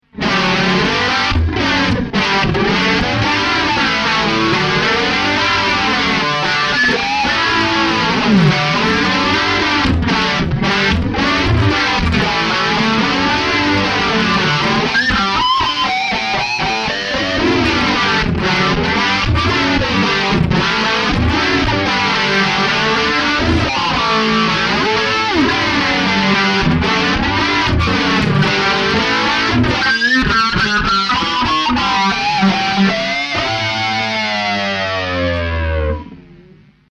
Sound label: Framus Diablo